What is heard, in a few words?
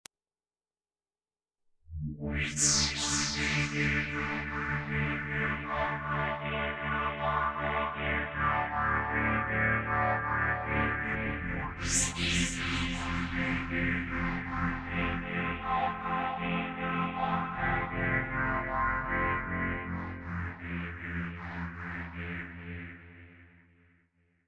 Oasys wave sequence